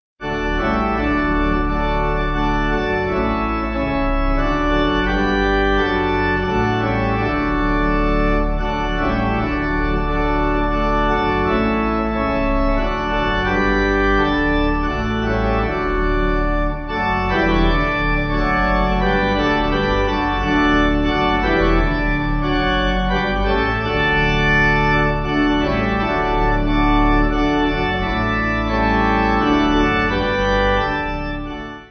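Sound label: Pipe, Pipe Organ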